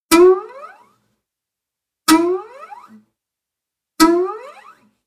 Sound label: string slide up hit